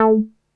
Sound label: NASTY B, BASSES